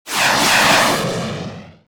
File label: Ship Weapon Spinal Guided Missile